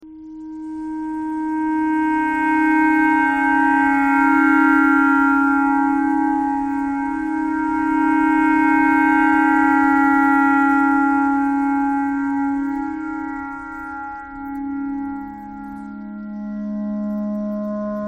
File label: clarinet clarinet pentatonic